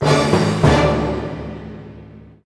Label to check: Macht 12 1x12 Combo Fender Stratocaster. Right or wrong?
Wrong